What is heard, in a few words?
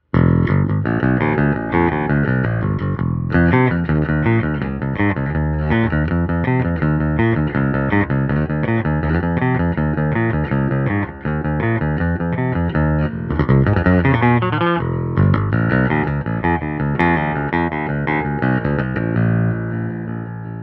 Bass Basic, Jensen Xfrmrs